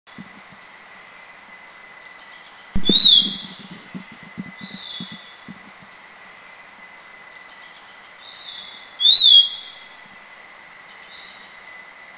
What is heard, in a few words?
Black-capped Babbler, Thailand